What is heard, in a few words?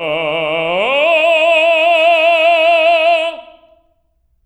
TEN E3, TENOR; TENOR OCT